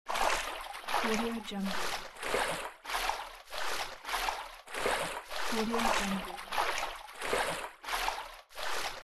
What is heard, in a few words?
footsteps on the water